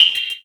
Spitter Kick, Esoterik Beatz; Spitter Kit

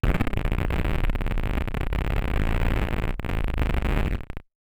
Malfo Syn, malfunctioning synth

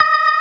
ORGAN ALL STOPS; Keith Emerson Signature Series

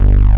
SAW BASS; Pro-Rec - Synthsonic; BASSES

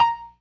PERC, Synth and Organ

